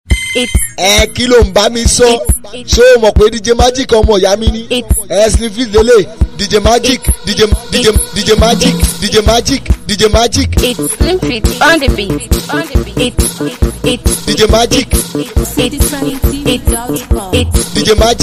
Beat Instrumental

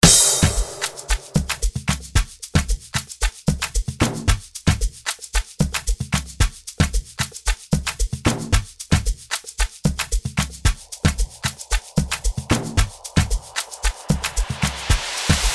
Free Beat

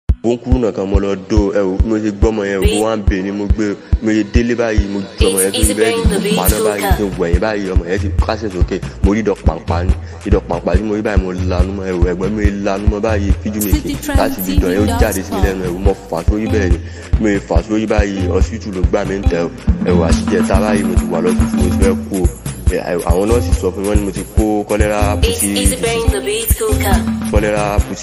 Instrumental

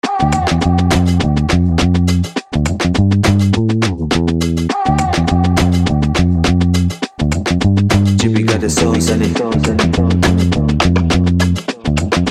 Type Beat Freebeat

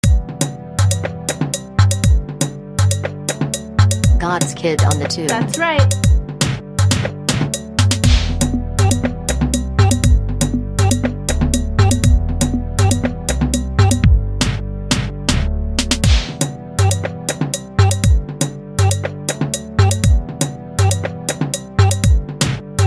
Instrumental

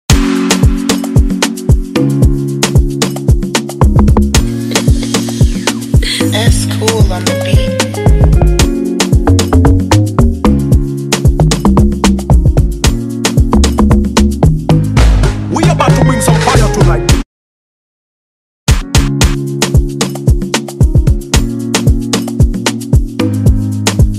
Amapiano Type Beat

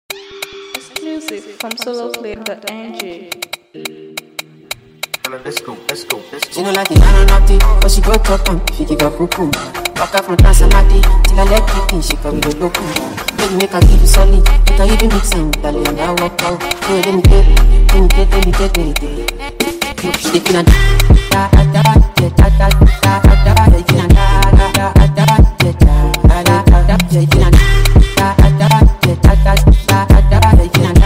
Afrobeat Remix

